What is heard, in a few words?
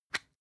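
floss open compartment, Bathroom; haus-garten; badezimmer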